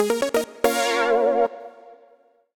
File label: SynBrass melody lick (Bb) 94bpm; Music Loops; Kersahw; Ableton; Singularities